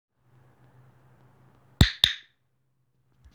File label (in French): Bruit du clicker, chien